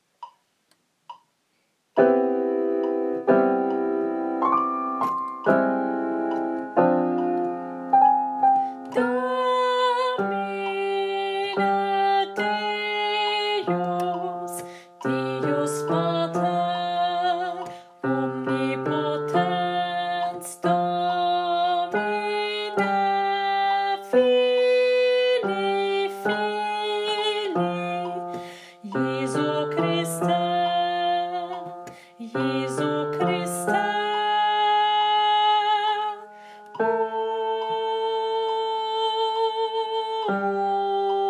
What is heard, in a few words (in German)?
Gloria, Bass; Bass (Teil 2); Messe; Chor